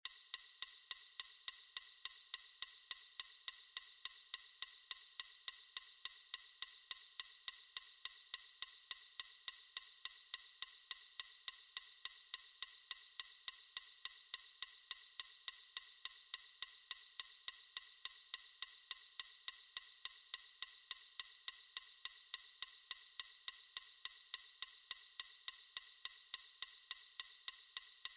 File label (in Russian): метроном 210